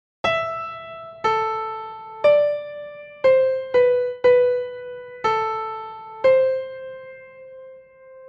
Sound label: melody